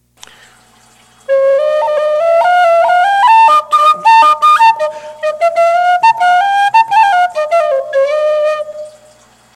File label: echo